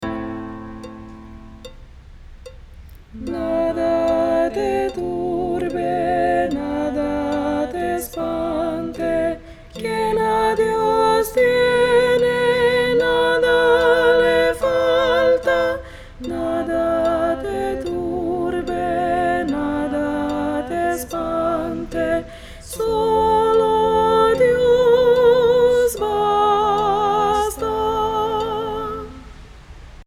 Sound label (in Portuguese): soprano